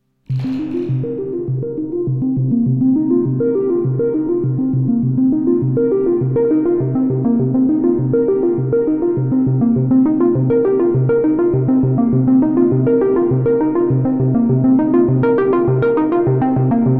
Grandmother Moog Curiousity

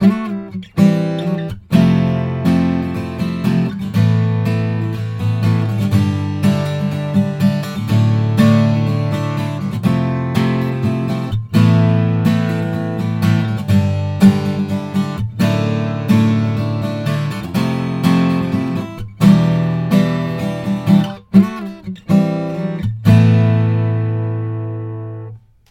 Strumming Dry; se electronics vr2; میکروفون استودیویی و حرفه ای اس ای الکترونیکس VR2; Musikhaus Thomann

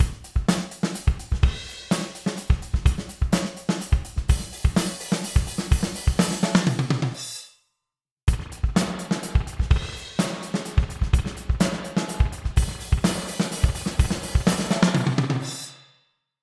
Drum With Spring Reverb; انواع ریورب

